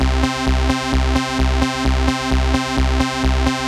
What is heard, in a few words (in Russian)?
Dancer Bassaw, бас сэмплы eurodance